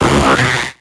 Grito de Huntail

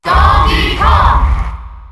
Grito del público; Donkey Kong; SSBM

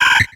Grito de Swablu

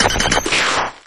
Grito de Diglett

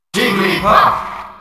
Grito del público; Jigglypuff; SSBM

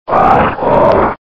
Grito de Camerupt

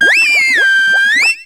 Grito de Primarina

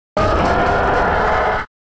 Grito; Rampardos

